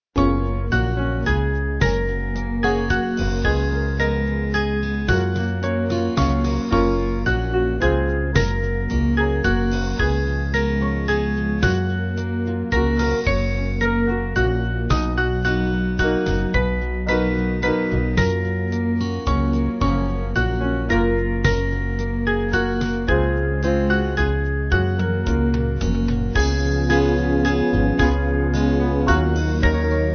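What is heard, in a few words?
Band, Music Backing Files for Churches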